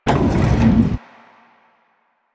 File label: Sliding Door Open; Warframe; Ambience; Nineteen Ninety Nine